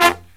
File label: LOW HIT, Brass